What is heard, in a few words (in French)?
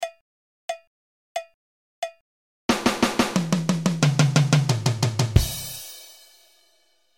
roulement 4 temps